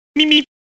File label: MEEP MEEP